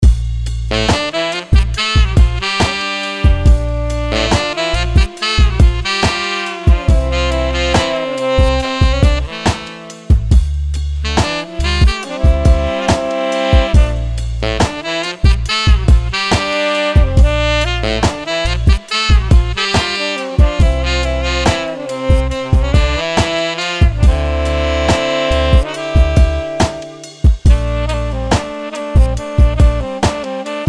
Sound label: Sombre Sax